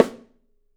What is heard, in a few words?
Drums, MARCHING; 3D Drums; OP MARCHING